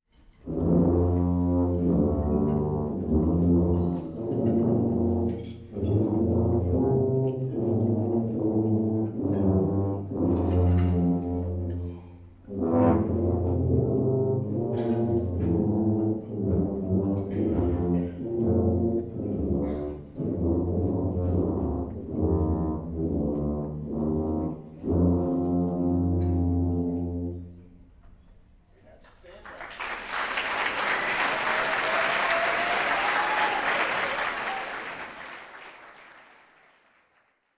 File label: Tuba